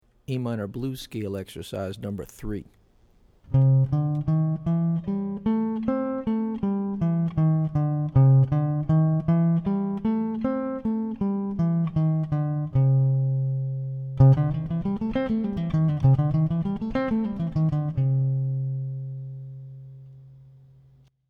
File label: A Minor Blues Scale; lead skills materials